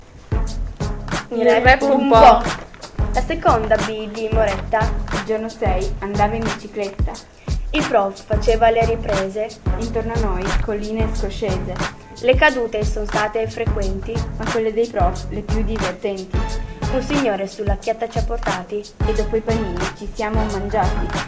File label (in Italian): rap